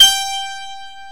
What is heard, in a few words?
HARPSICORD